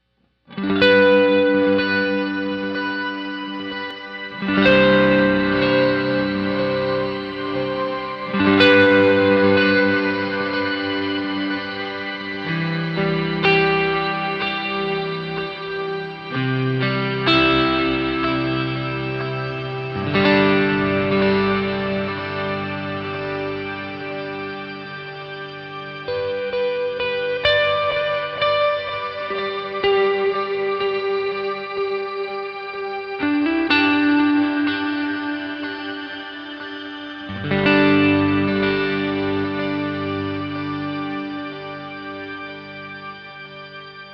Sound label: Tele Ambient